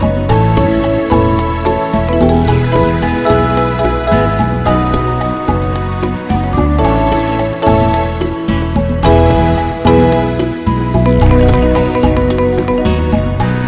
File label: Slow and Beautiful